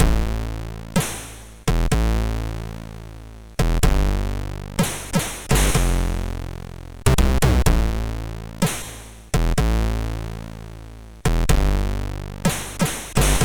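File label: Powerglove, MOS 6581 SID chip music, games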